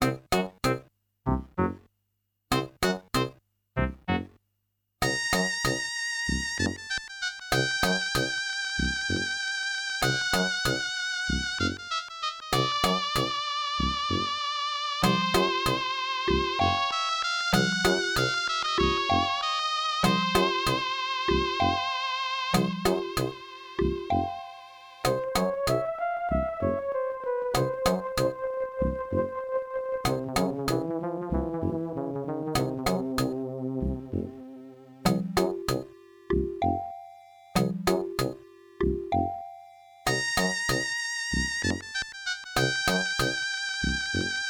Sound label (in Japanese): FM音源版; fray msx remastered soundtracks 2008